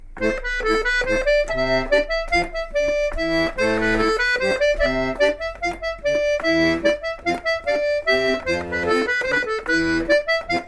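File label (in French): laride, accordéon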